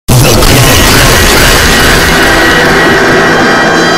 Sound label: the clunk bass boosted